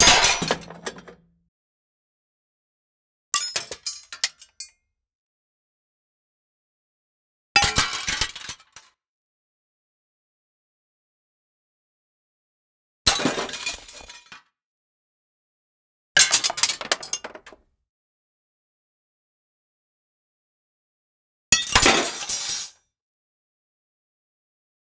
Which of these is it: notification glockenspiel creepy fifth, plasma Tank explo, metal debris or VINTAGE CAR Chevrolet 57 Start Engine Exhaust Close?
metal debris